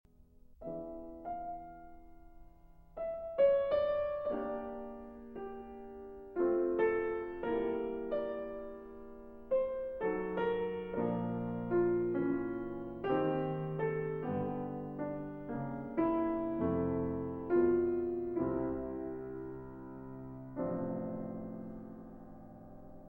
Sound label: Piano Sonata No. 2 in B Flat Minor, III. L'istesso Tempo. Allegro Molto